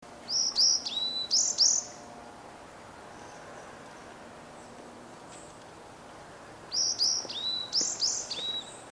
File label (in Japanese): yamaga